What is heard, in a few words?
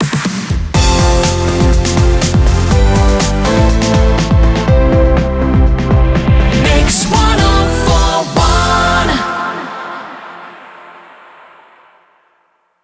122bpm a mix ramp; WBMX